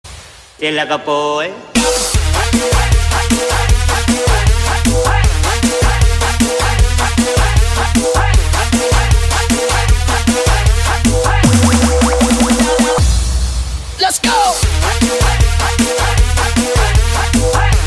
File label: Thai remix